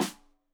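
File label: Snare Stereo, Drums; Deeply Sampled Drums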